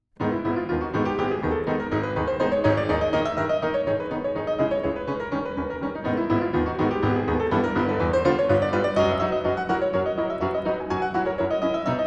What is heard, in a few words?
Prelude g sharp minor